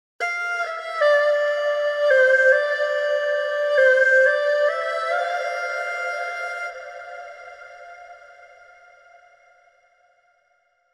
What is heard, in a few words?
Erhu; Phoenix Orchestra; Valhalla